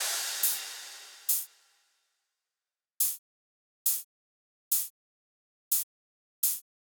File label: Riddim Drum Loop 140 Top